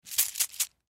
Coin Purse Shake; foley; Money Sound Effects; Objects; Blastwave

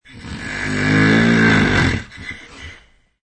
Snore, Human snore; Human snore variation 5; Snoring; Michael Huang

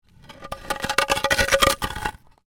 Ice: drag large block of ice short distance; Snow; Stuart Duffield

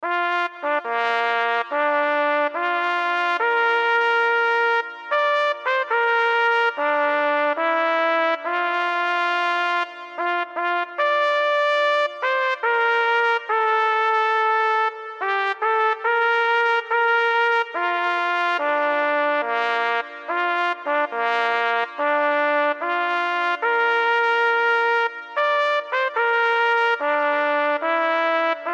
National Anthem United States Trumpet Solo Music; Military; Warfare; Concert; Blastwave

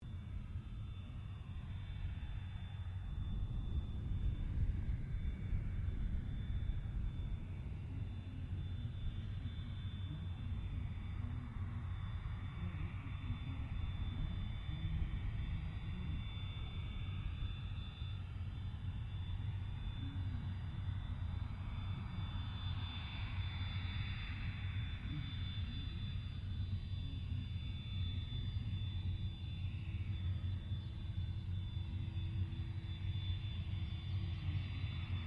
AIRCRAFT CA-27 SABRE TAXI SHUTDOWN DISTANT; CA-27/F-86 Sabre; Airplanes

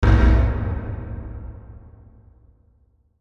Hit Dark Airy, Hit Dark Airy Reverb; Production Element Imaging Element Accent Transition; Blastwave

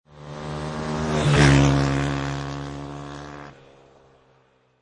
Motorcycle Dirt Bike Drive By Right To Left; Motorcycle motor. Motorbike type offroad Dirt Bike

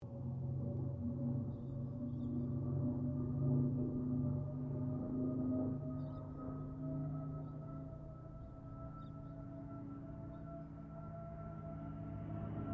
AIRCRAFT CA-13 BOOMERANG PASS

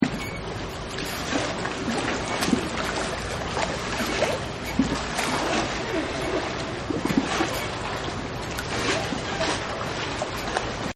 Boats Creaking; Vehicles; Partners In Rhyme

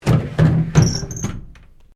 MAP DRAW CLOSE, Sounds onboard HMAS Castlemaine; Boats (Sound Effects:Vehicles)

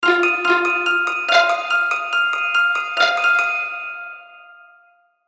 Musical Twinkle; Musical Twinkle Trill Plucked Strings Accents; Miscellaneous Musical; Blastwave

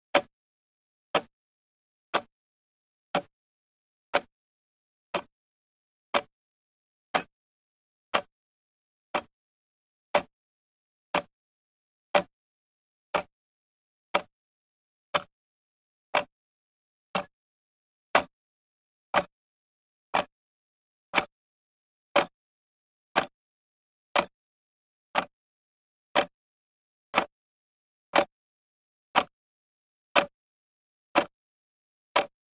Old Clock Ticking, Old wall clock; grandfather clock ticking seconds, for one minute; Clock Sound Effects

